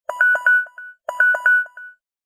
Samsung S22 Ultra Message

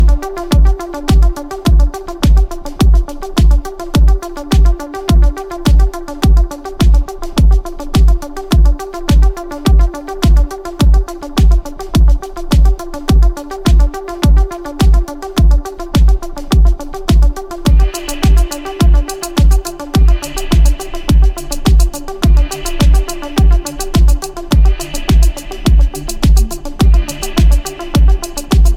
Electro Dreams

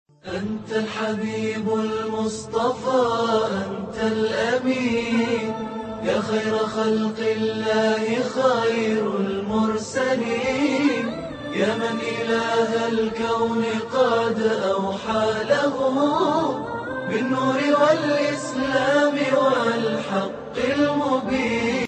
islamic ringtone without music

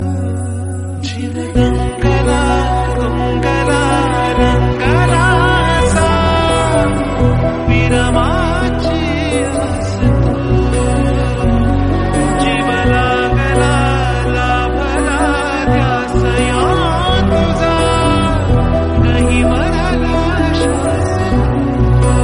Romantic Marathi

